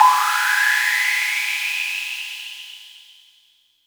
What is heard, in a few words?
vermona drm1 mk3 hh